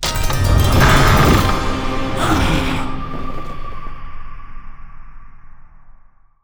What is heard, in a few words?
bonus win; netent